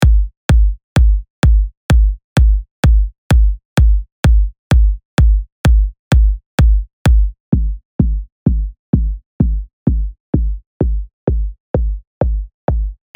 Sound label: kick